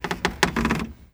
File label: foot wood creak, footsteps